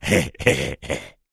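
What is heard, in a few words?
sounds of voice of caveman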